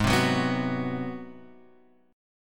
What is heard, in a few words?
Ab 7th Sharp 9th, Guitar Open F, chord; Ab7#9 strummed; JGuitar